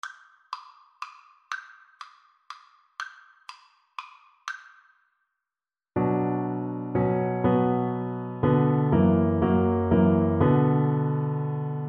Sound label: DB, Double Bass